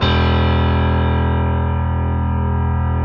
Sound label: Piano E0; Proteus 2000; Digital Sound Factory Proteus 2000